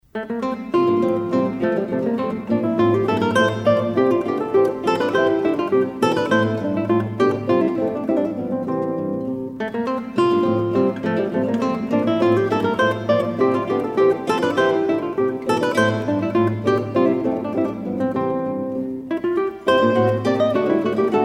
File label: Bourree I II; Bach